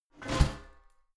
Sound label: garbage can close lid hard